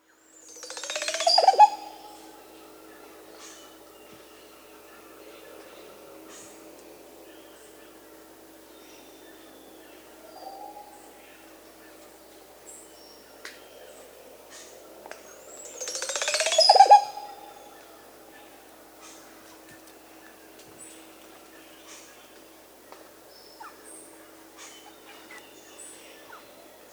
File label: Olive Oropendola, Psarocolius, S. America; Psarocolius yuraca